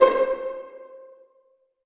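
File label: string pizzicato C4 mezzo forte right; Sound On Sound - Peter Siedleczek's Orchestra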